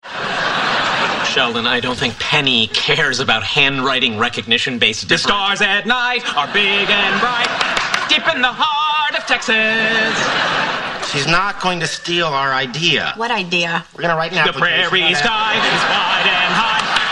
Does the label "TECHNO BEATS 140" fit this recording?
No